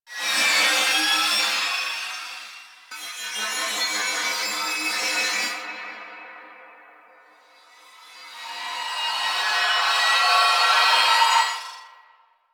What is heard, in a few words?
Sword Buildup; Cinematics; Warframe; Orbiter Cradle